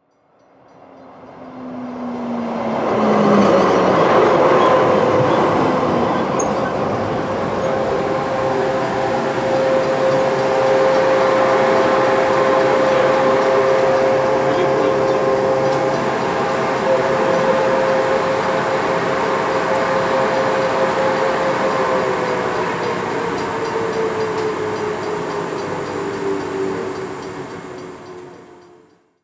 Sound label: trolley usa outside